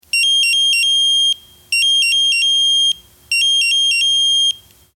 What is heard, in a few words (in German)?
Pieper